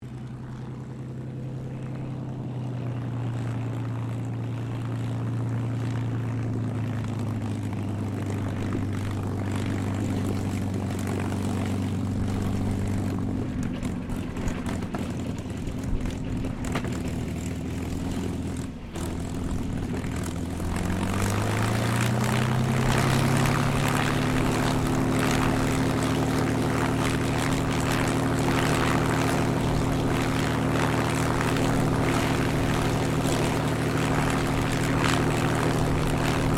AIRCRAFT CAC CA-18 MUSTANG MK23 TAXI SHUTDOWN WINDY; CA-18 /P-51 Mustang; Airplanes; Vehicles; Aircraft Collection; Twistedtracks